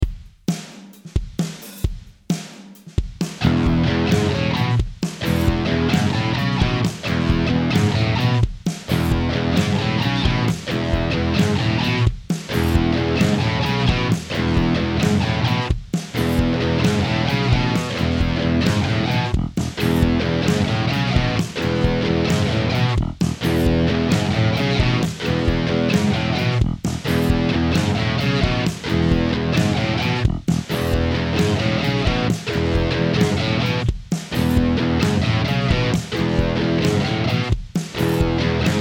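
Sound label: Lead test single note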